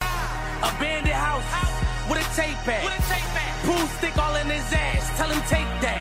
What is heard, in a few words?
Abandon House Music